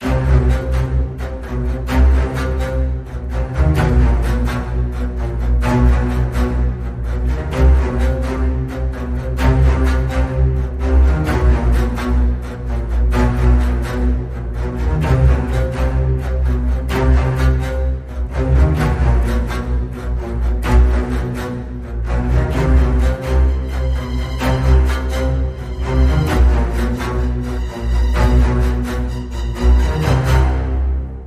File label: Strings Only Ending